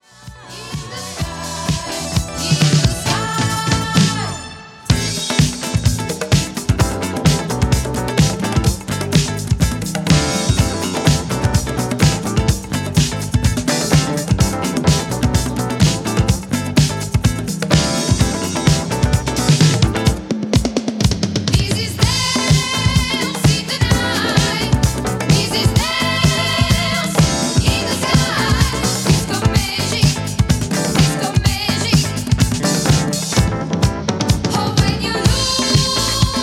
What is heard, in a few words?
drums, disco